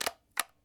Whisk Reload

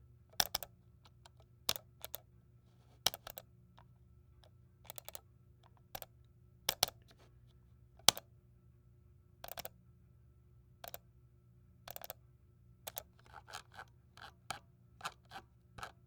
Office Mouse

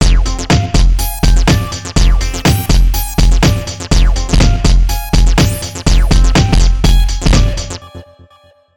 Fat Break Hats Acid

